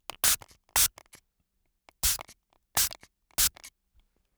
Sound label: throat spray